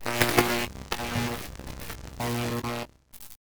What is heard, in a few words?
shocking grasp; cantrips